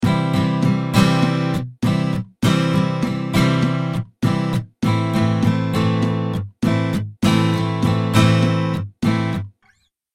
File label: strumming pattern